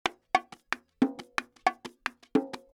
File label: bongo